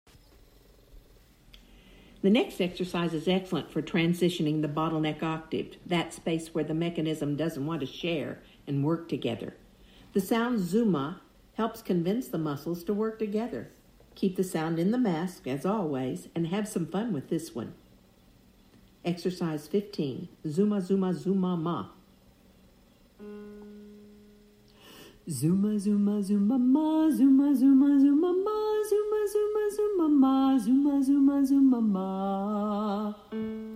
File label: Vocal Warmup Zooma